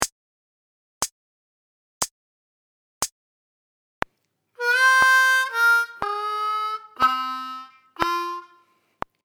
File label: Lick Slow, harmonica